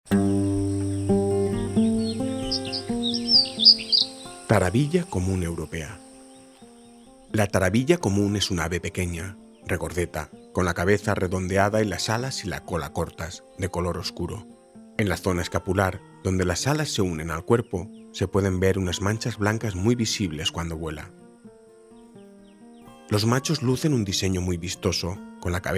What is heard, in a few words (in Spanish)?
tarabilla, aves; lavanderas